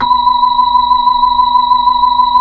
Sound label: B-3 Hollow; Keyboards of The 60's and 70's